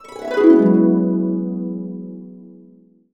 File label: E, Harps